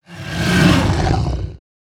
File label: scratch, creatures organic